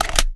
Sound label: reload empty mag in; xmag; gmod; viper; weapons